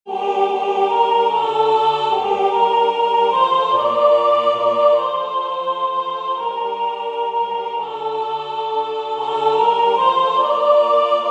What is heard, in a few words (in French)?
soprane; Jan Josef Bozan; Nitida Stella